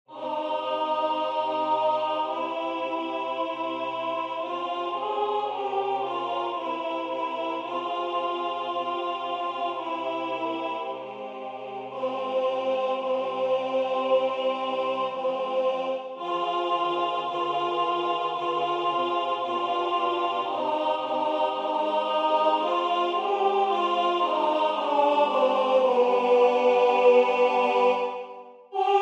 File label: Adoramus te Christe alto; Gasparini